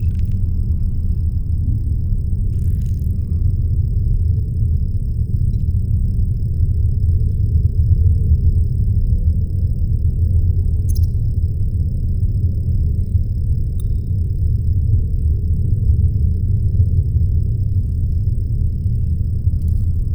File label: SHIP ambience loop; starruler